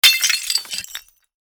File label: ceramic vase drop